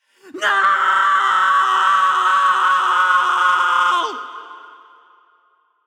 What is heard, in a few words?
Screaming No With Reverb